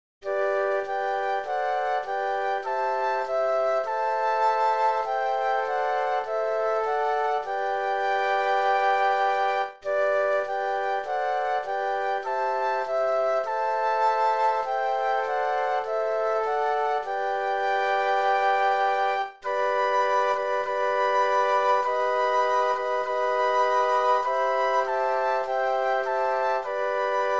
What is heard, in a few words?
Flute Quartet